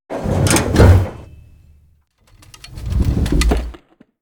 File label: Windmill Handle Down